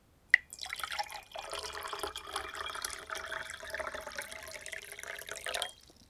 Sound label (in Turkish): bardağa su doldurma sesi